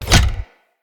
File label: Player Bow Headshot Hit; Weapon Impacts; Sweeteners